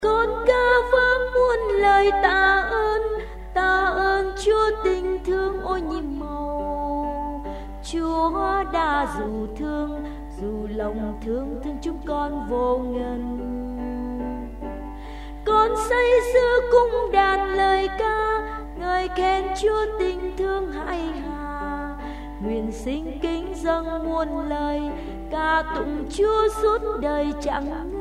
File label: sop alto